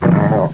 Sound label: shock trooper pain; svencoop